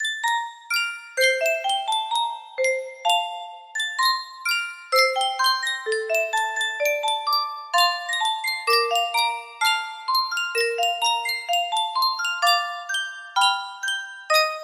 yunsheng music box

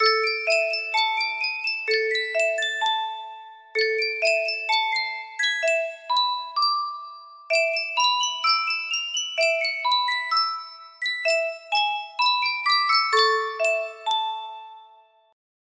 Sankyo Music Box, music box melody

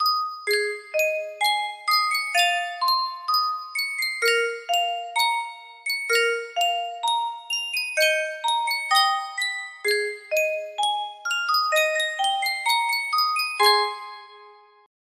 music box

